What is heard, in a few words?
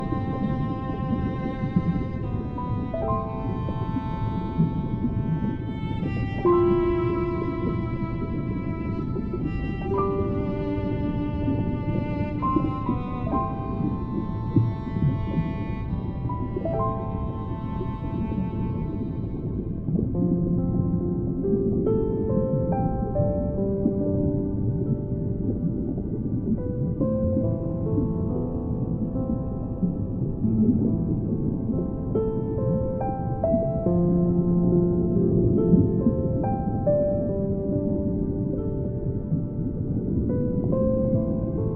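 Music for concentration soft sound, calm stream; Muzyka dlya koncentracii vnimaniya Myagkij zvuk; Spokojnyj potok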